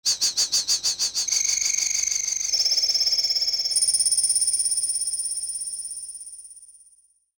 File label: Insects